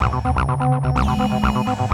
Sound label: ACID JAM